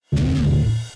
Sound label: round transition; A Sound Plan